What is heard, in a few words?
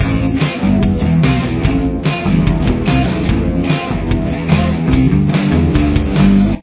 riff, guitar